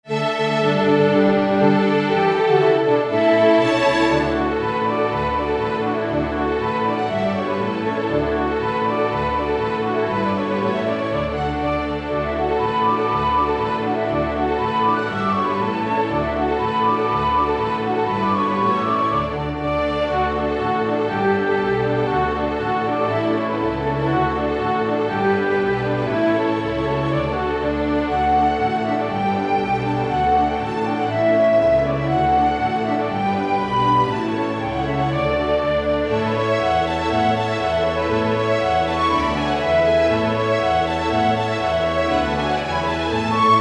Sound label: Hoe Down Flute